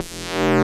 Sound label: laser buzz